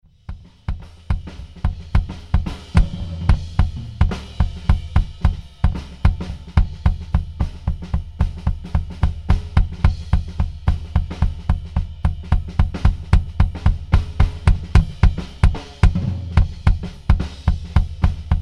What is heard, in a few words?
Drums Kick